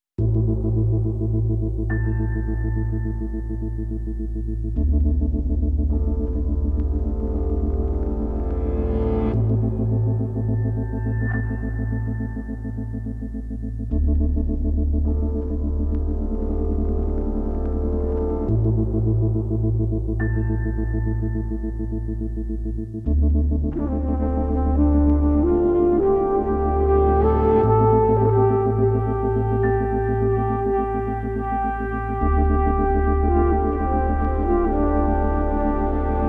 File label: Spoken (Trumpet, Keys, Gongs & Birds)